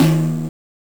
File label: Timpani; Genesis